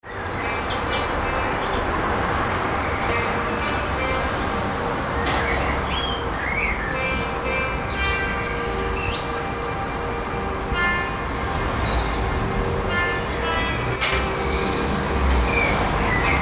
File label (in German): Abendstimmmung Autos Glocken Amsel Ferne Sax